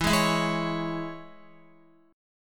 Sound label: E 6th, down, Guitar; strummed